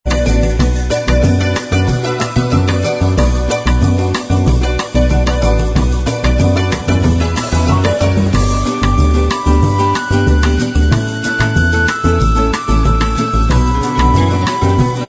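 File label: bossa style instrumental track, backing tracks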